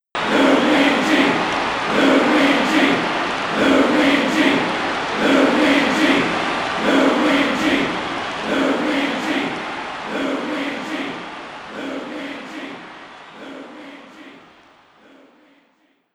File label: Crowd Chant; mario strikers charged football wii gamerip 2007; Luigi